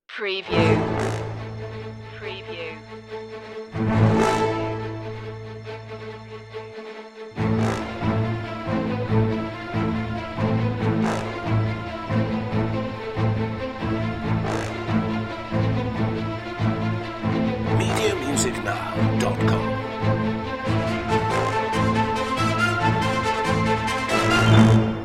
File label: chase no percussion